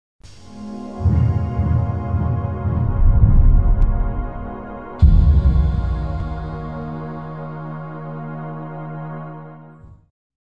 Logos; Eidos Interactive; Crystal Dynamics

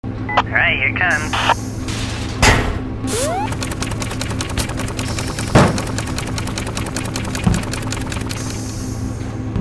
Robo Helper; Infiltrating The Airship; The Henry Stickmin Collection

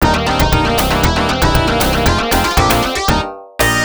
Round Up (Round Clear); fantasy zone; system 16a arcade

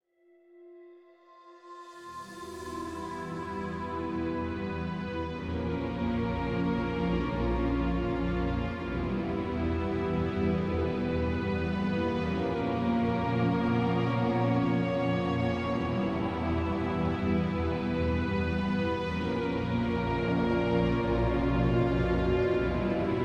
Ambient Ancient shadows; Shadowgrounds; windows gamerip 2006

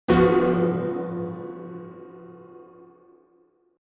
Piano hit; Among the Sleep

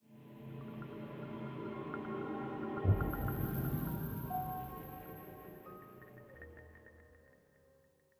85bpm; Legends of Pegasus; windows gamerip 2012; Research High

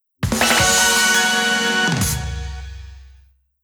Unknown Jingle; Pokemon Sword Shield

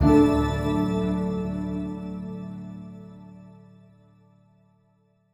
Stingers Sting Gain; gamerip; Hand of Fate 2

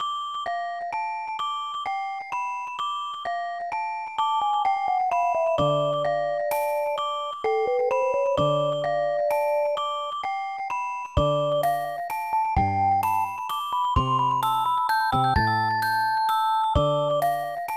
Waltz; Super Momotarou Dentetsu II; SNES; Snowman Waltz

